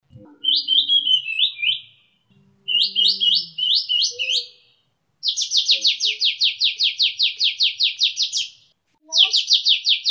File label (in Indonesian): kapas tembak suara isian